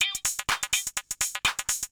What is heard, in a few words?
PERCLOOP HOUSE 125; eJay